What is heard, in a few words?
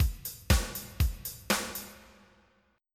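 heavy rock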